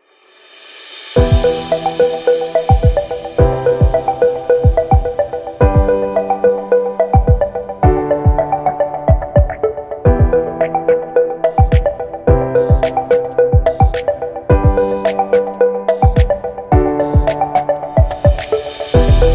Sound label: Technology Commercial; service business